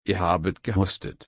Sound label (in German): husten